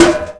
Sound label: bouncy metal chimney hit; bouncy objects; Tony Hawk's Project 8; XBOX